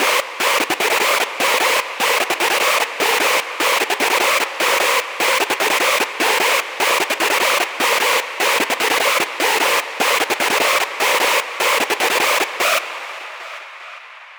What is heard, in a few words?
Detuned Raw, hard techno; vital presets; teknovault hard techno vital presets vol 2